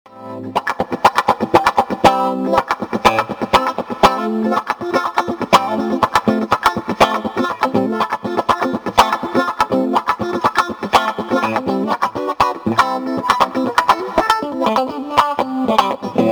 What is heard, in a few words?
CRY BABY WAH; jim dunlop; カッティング